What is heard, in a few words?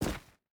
footstep boots stones jump land; Rust